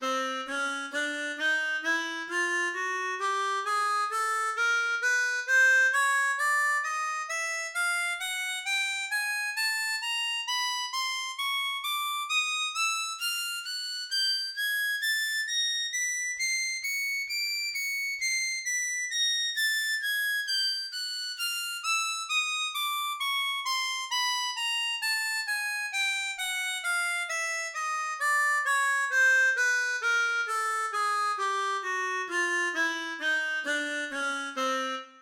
Gamme Chromatique, harmonica

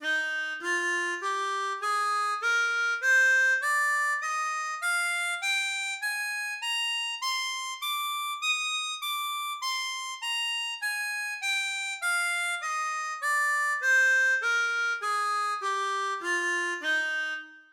Mi B Majeur, Gammes, harmonica

